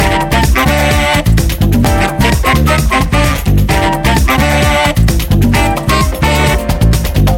Latin Pieces Signature, ringtones

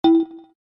electric, keytone

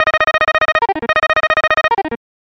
High Beep, phone tones; LG GB258

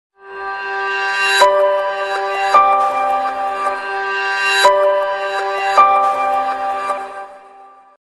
dramatic piano; A3100

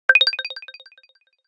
Alert Modern Mid; HTC Touch Diamond

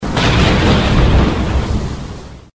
Thunderstorm, notifications; NEC Casio MEDIAS-ES-N-05D

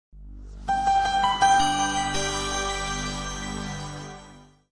power up, phone tones; LG GD570 dLite

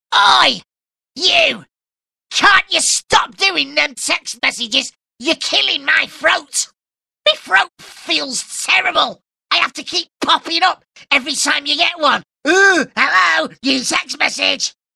sore throat texting, ringtones; Arphiola ringtones

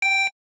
dial tone pound; OnePlus One China

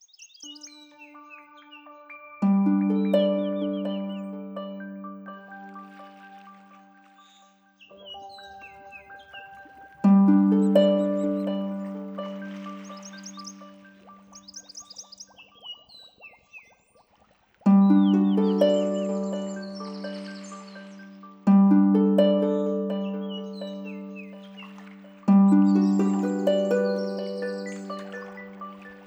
Morning, alarms; Walton; Primo-NF2-Plus

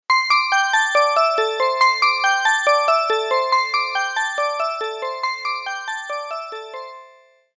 Echo tone; Samsung; GT-M2520 Beat Techno

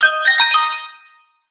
Text Tone; Audiovox CDM-8940

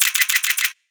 Camera Continuous Shooting; Alcatel Pixi 3

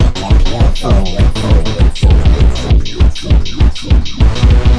techno; Google; NewWave Labs

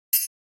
Unlock, phone ui

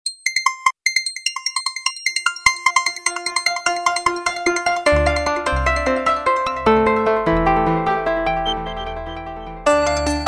harmony; Motorola RAZR2-V8

